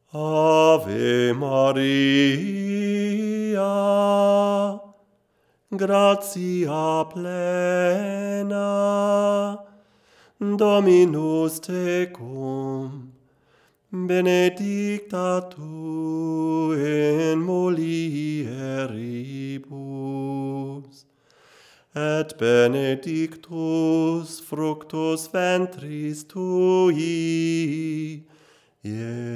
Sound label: Ave; AMI KM100f